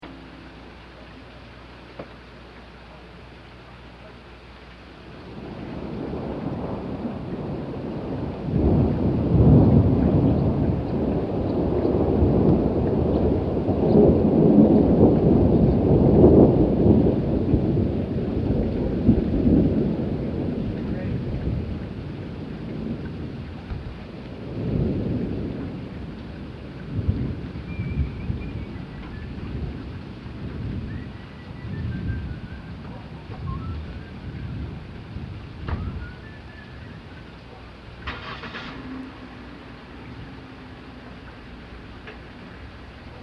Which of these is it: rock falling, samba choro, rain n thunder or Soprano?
rain n thunder